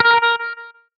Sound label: Hitsound menu note